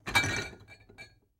Glass Clinks; Glass Bottles Clinking And Rattling. - Refrigerator Door